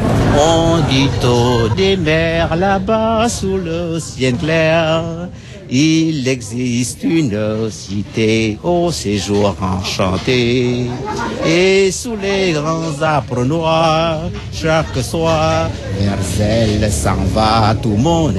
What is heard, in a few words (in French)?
Chanson vietnamien